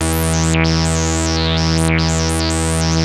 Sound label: 2600 E3, ARP 2600; Hollywood Edge - Giorgio Moroder Rare Synthesizer Collection